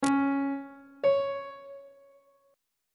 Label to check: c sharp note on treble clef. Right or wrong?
Right